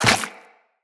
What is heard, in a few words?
Run Goo, Footsteps; Tenno